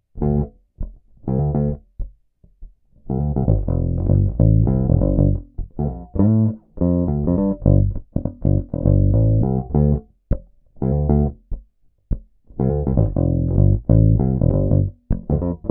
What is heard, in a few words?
Humbucker Neck Pick Tone DOWN; peavey shootout audio samples